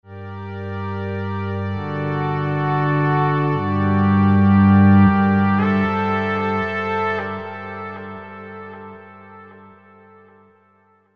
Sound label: Pad; UA Masterclass; DnB; Jade Runner; drum and bass